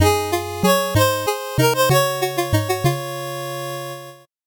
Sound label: 8bit jingle normal